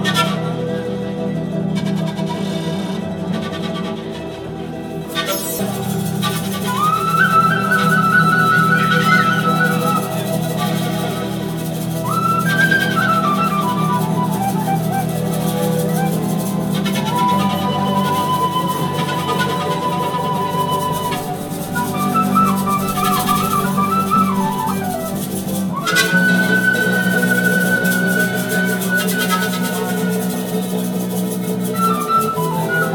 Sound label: flutes guitars peru; best service Blue Box